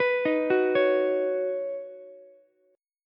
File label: Db 7th Suspended 2nd Suspended 4th, Ukulele; 16,15,14,16; arpeggiated; chord shape; JGuitar